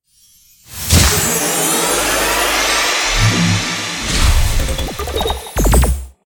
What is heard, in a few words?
cash stack intro sequence sfx; pork knox